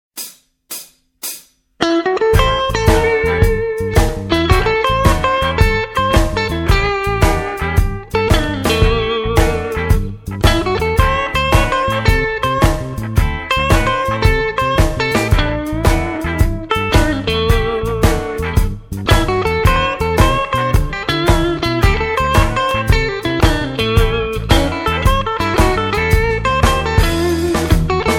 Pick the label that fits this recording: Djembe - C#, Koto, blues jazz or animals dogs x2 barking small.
blues jazz